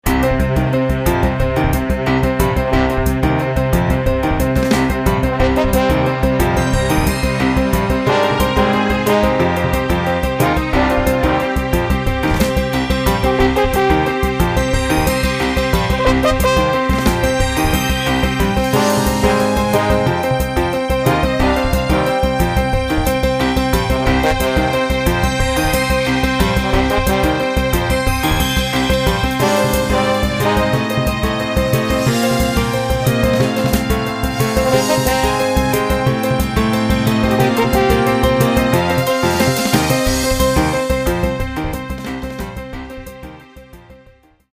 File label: Tension